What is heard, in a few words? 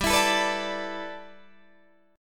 G 6th Add 9th, Guitar, Open D